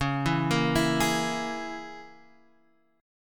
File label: Db Minor 6th, Dbm6 chord; Dbm6 arpeggiated; x,x,x,6,4,5,6,5; JGuitar